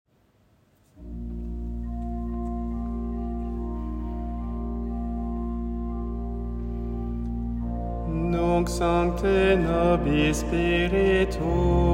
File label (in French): tierce memoires impair ordinaire latin; heure mediane impaire ordinaire